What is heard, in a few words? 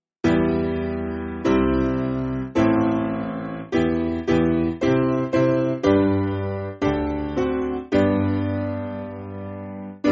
Piano, Free Music Backing Files for Churches; When We Are Tempted; Psa 22; 4/Em